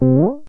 show choice; anxiety; NotDotTeam; ui

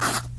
pencil; crowds; NotDotTeam

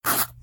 pencil; crowds; NotDotTeam

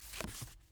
flip down; NotDotTeam

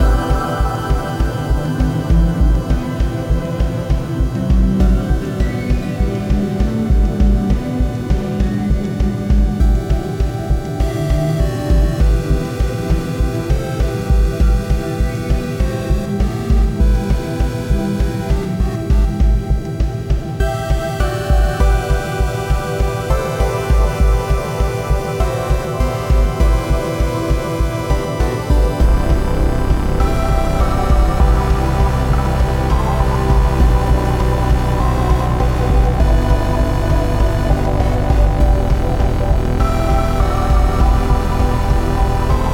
battle dark loop, music; anxiety; NotDotTeam

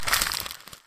trash; crowds; NotDotTeam

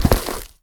grass step; anxiety; NotDotTeam

